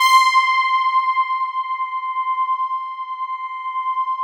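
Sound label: keyboard PAD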